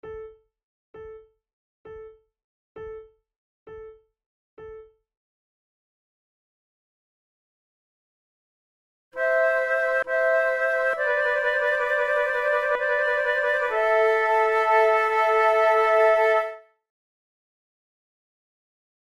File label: flute sonata adagio; mattheson